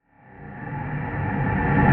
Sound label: Riser